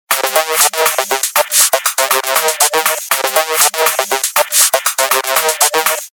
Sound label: Squash tone, Samsung; SGH-U800E SoulB